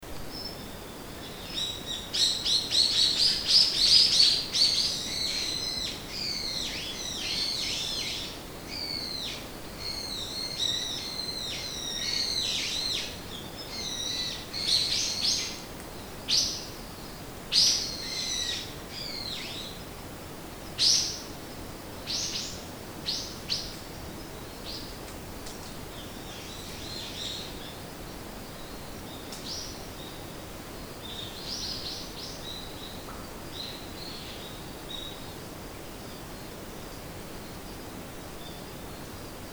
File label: bird